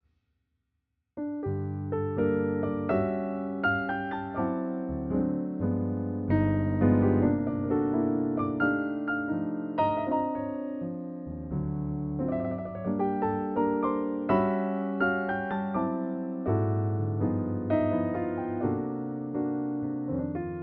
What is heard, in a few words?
pianoteq ambio; iem